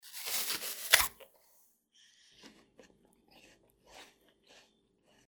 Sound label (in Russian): jabloko; Звуки откусывания